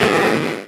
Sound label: stretch short; music room sfx; birthday party bash; wii; Spanish